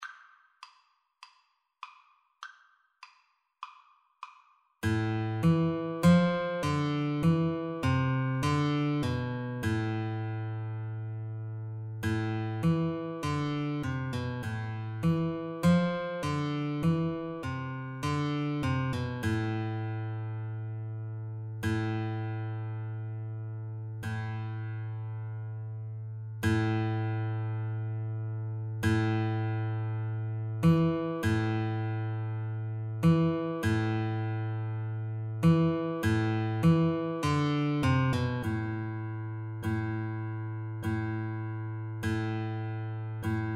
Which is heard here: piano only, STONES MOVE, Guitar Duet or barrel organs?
Guitar Duet